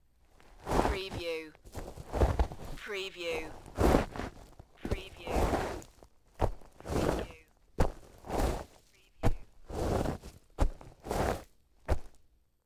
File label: DIGGING GRAVE